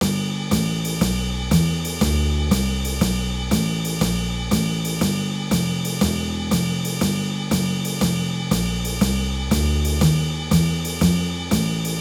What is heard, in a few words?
Jazz Basics